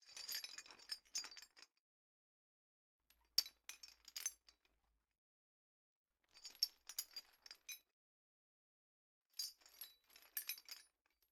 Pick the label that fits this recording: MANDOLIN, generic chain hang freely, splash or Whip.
generic chain hang freely